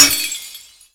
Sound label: Glass Impact; Warframe